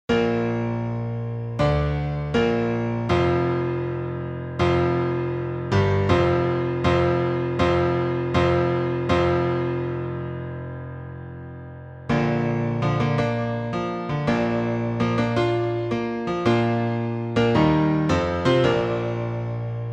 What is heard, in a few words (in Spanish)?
HIMNO DE ARIAS con piano